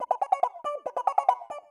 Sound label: vel wah, 140bpm keyboard; hous-tec